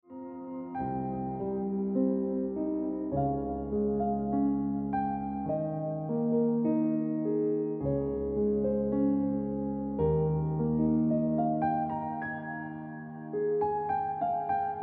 Deep In Mind Ringtone Piano Music

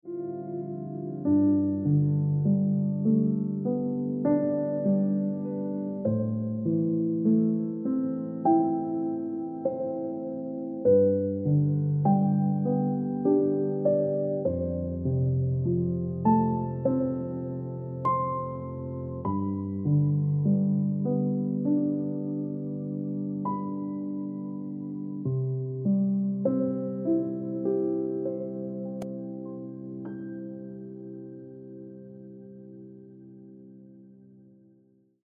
- Instant Ringtone Piano Music

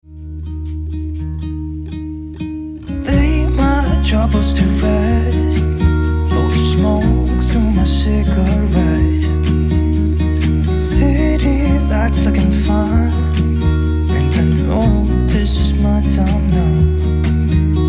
Acoustic Version

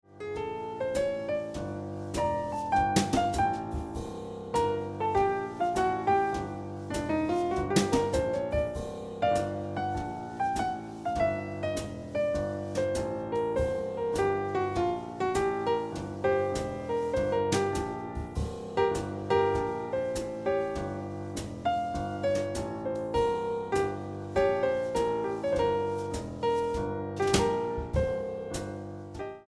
Piano Music

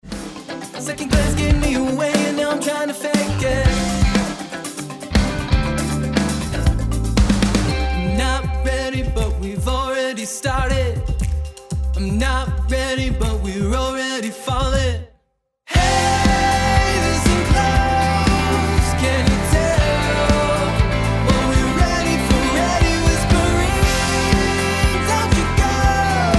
Falling Ringtone

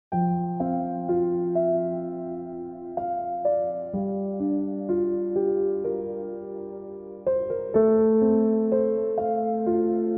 Ringtone

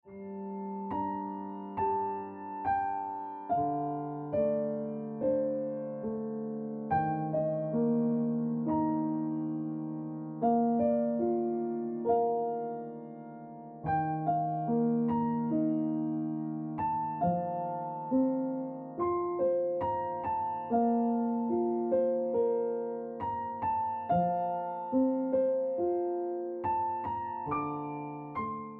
Piano Music

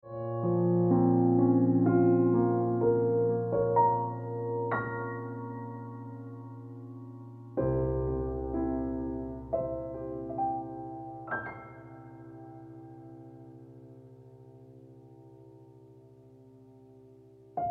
Ringtone Piano Music

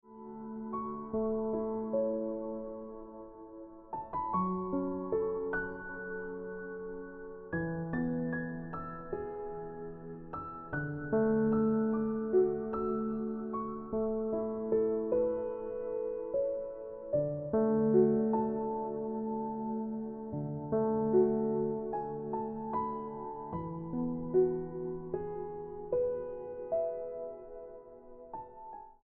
Into The Woods Ringtone Piano Music

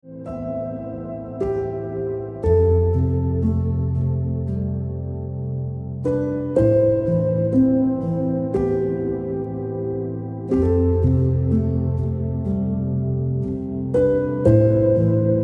Just You Ringtone Piano Music

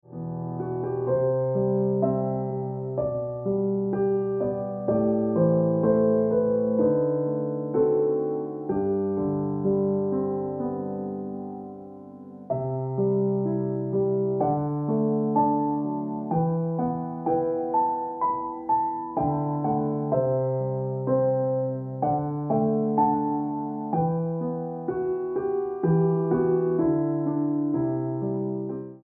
Piano Music

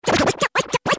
Strange Ringtone

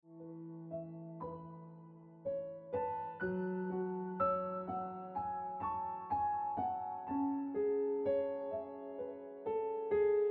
Piano Music

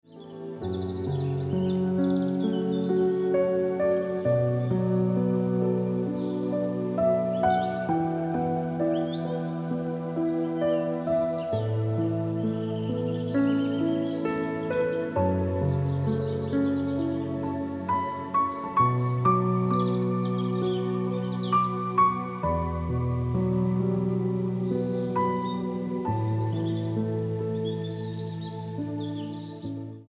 Lofty Hills Ringtone Piano Music

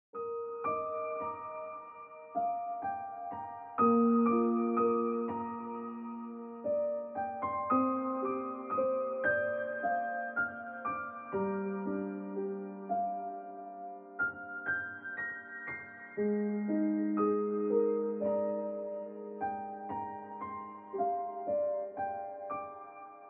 Like A Radio Song Ringtone Piano Music

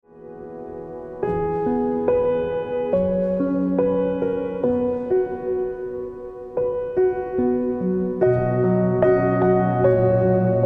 Ringtone

